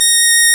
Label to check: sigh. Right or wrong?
Wrong